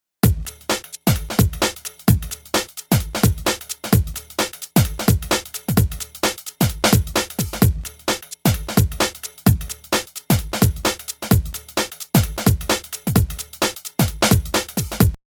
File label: Beat original